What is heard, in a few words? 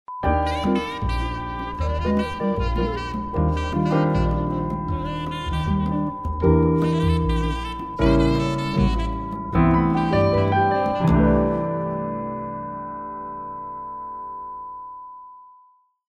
groovy jingles